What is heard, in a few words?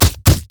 bear basic attack; LoL; annie base